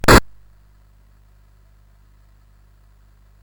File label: CSG8580R5, GAMES; Street Rod